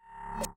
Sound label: Volume Unmount; system